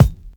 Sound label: good steel kick drum sound c key